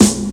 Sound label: Snare, Percussion Hits, Snares